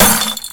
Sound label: glass